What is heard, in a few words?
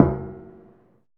footstep metallic; The Swapper